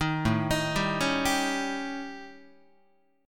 A 7th Flat 5th A down Guitar 4A,4E,5A,5D,5G sharp,6C sharp; arpeggiated; JGuitar